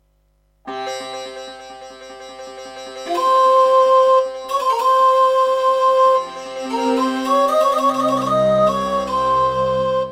Roland SC-8850; Prince of Persia 2 - Intro

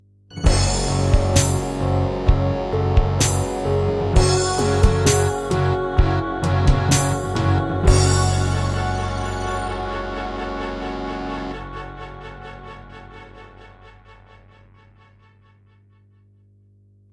Creative Wave Blaster; Doom (Sigil); Introduction

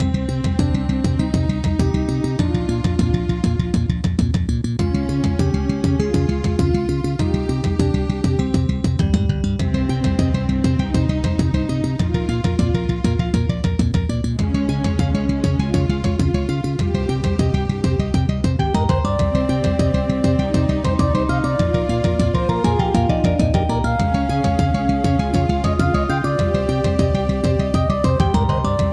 Introduction, Casio CTK-800; Hocus Pocus

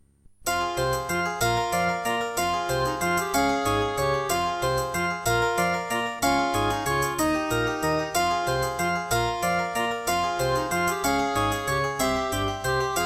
Serdaco E-Wave; Death Gate

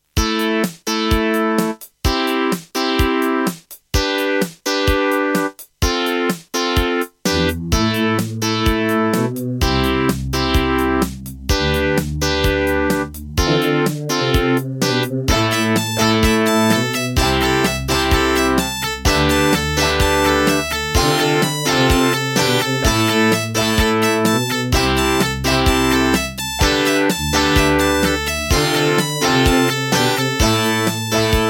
Wave 1 [Creative Midi Blaster MB10]; Raptor

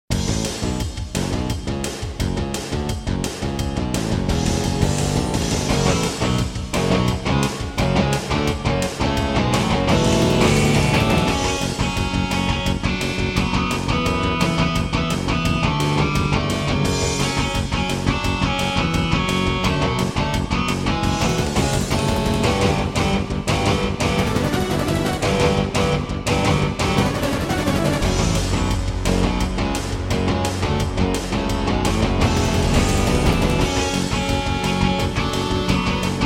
Intro, Korg 05RW; Duke Nukem 3D

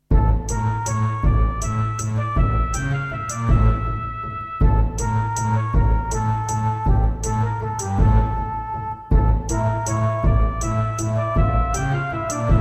Miditemp DS-48 CleanWave32

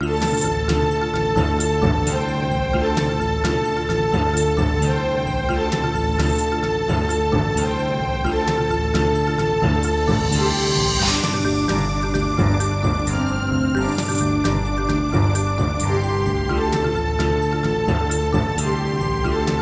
Yamaha MU5; Heretic

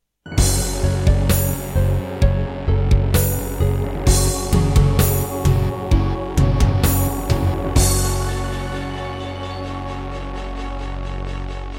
Introduction, Dreamblaster X2GS; Doom (Sigil); Serdaco

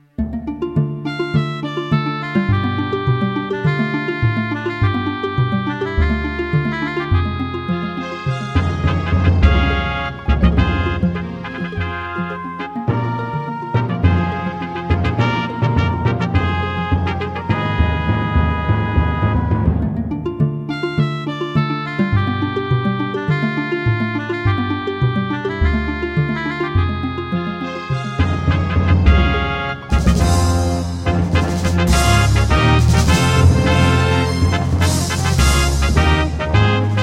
[Midiplus MiniEngine USB]

